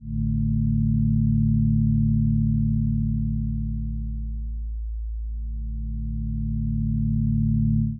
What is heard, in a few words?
tavern, music